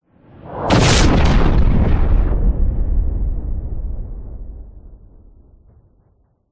science fiction impact explosion spacecraft blow up missile; zapsplat